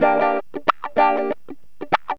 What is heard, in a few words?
GTR, CHANK; Zero-G; Funky Elements